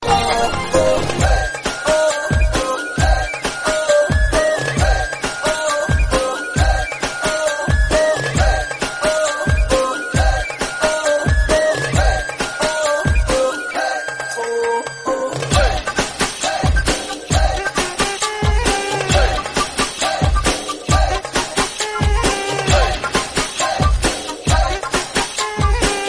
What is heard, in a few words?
Flute BGM Ringtone